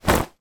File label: clothing bodywarmer gilet shake; zapsplat